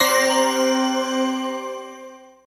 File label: Meteor Drop